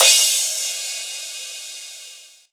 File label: Crash, Drums Cymbal